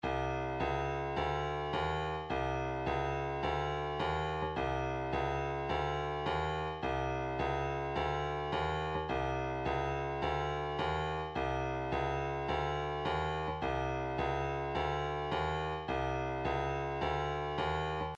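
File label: 106 kick, midi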